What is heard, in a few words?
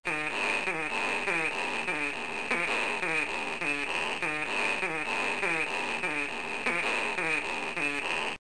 striped possum crabbing